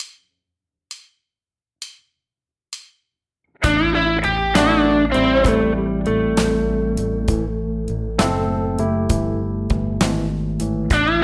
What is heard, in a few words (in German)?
pentatonik gitarre hoerbeispiel lick a moll